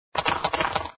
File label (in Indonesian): jalan; mon the monkey